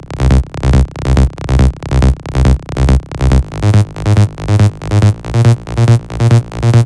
Bassline; Vengeance Samples; No Fear

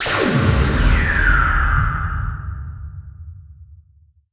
Downlifter

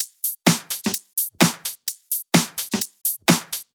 Drumloops 128BPM

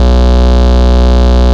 Root B, Ultimate Bass; Vengeance Samples

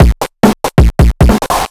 Breakbeat; Vengeance Samples; VEC2 Loops

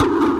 BD Distortion, Bassdrums; Vengeance Samples

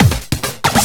Loops Fills; Vengeance Samples

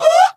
Vocal Oneshots; Vengeance Samples; Vocals

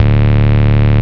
Bass D, Root D

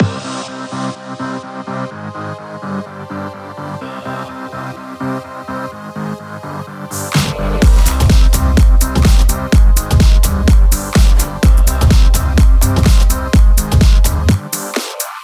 Mix, 126BPM; Vengeance Samples; Photon Kit

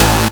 Synths; Vengeance Samples

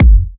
Bassdrums; Vengeance Samples

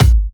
Hard Kick; Vengeance Samples

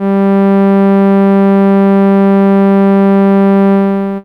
Cello G3, ARP Solina; Unmodulated; musicradar-synth-samples

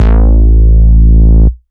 Bass Root A

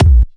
Bassdrums Tekhouse; Kicks; Vengeance Samples

